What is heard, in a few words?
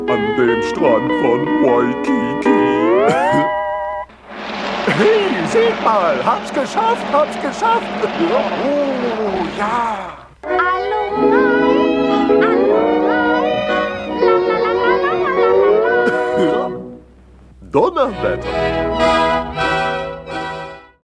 hawaiian